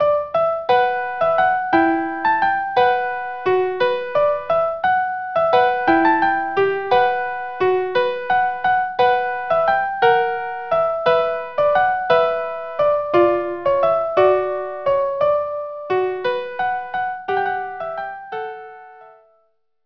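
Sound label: Canzonetta Tarantella violin violin; Rimsky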